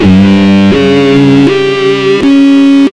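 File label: extra lead (creep climb); Sonic Foundry (Sony Creative Software); Ugly Remnants VOL-2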